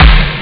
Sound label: strong snare